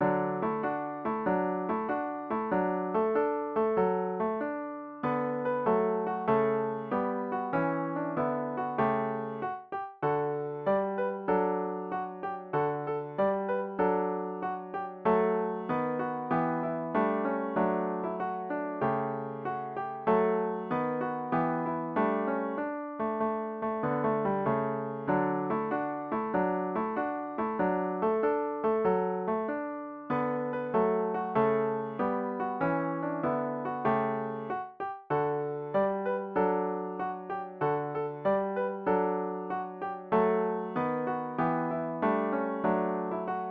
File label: here we come a wassailing piano